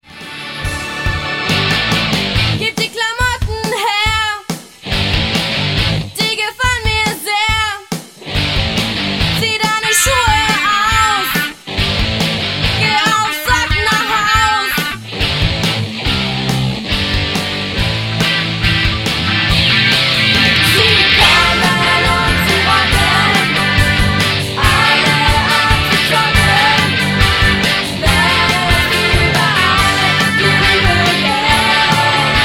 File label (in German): Ruepelrock